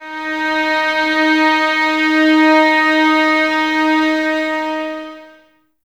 VS D#4, Violins; Miroslav Vitous; 11 Violins

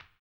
HAMBONE; ILIO; Vocal Planet VOL-2; Blues & Soul

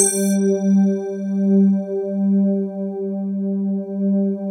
crystal pad; Sound Gallery - Charged

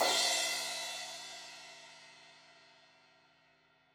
Crash 16in edge, Cymbals; Deeply Sampled Drums

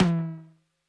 RACK TOM, ELECTRO KIT

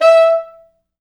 E4, Pop Brass; Best Service ProSamples vol.19; AKAI

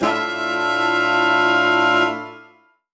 Horn Swell Alt Asus4min6; undercover samples

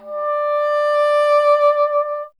WND BSN D, Bassoon; Miroslav Vitous - Solo Instruments VOL-1

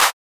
Roland Juno D Perc Menu Kit

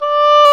Oboe f; Roland L-CDX-03 Disk 1; Oboe 4-8

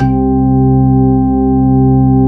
JAZZY ORGAN; Zero-G; Funky Elements

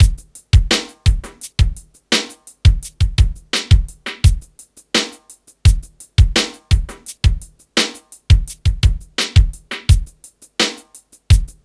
HOUSE, R; Funky Xtreams; INIS

